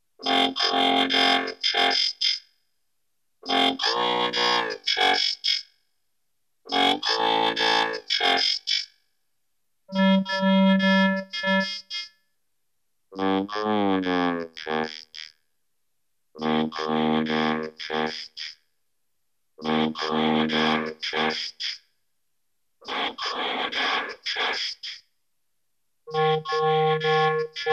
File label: Vocorder